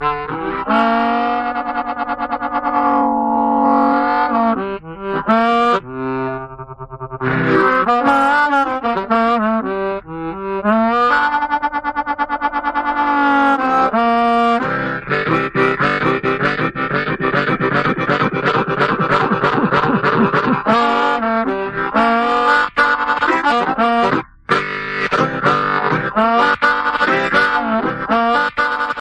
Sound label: blues harmonica ringtone